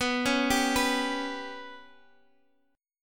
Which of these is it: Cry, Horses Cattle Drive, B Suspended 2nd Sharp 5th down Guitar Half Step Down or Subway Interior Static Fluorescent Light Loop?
B Suspended 2nd Sharp 5th down Guitar Half Step Down